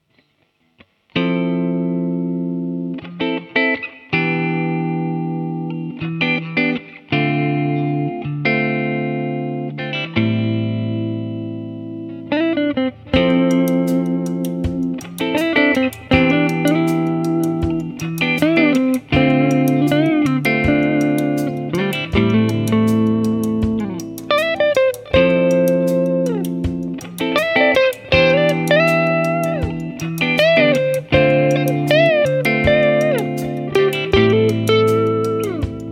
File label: gibson melody maker clean